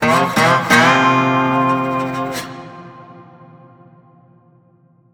large Win Riff; netent

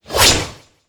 win sweep Horizontal; netent

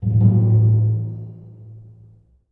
spin start; netent

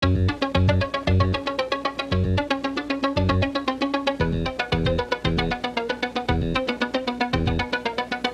main game music; netent

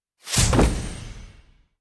chest Open; Robin; netent

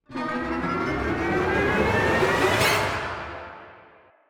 near Win; netent

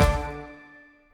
Near Win Reel Bounce; netent

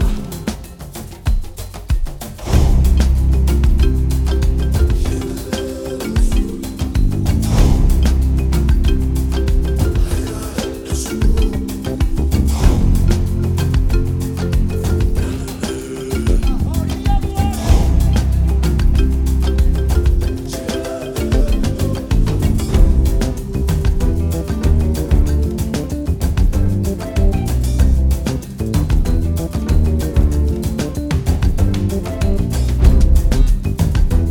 music main game day

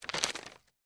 multiplier Unfold; netent

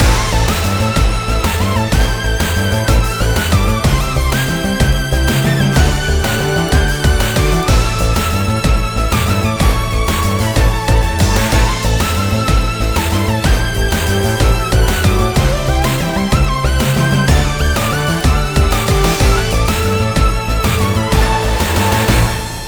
big Win Loop; netent

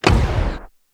quick Stop; netent

